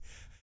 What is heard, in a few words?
DEEP BREATH; Spectrasonics; Vocal Planet; Blues Soul